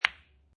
Billiard Pool Snooker Ball Hit By Other Ball Medium; Sound Effects:Sports